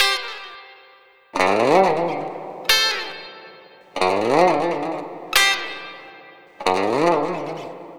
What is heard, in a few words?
Lounge Gtr C; Zero-G Kocktail Kollection; Construction Kitz (Lounge); Welk Lounge 090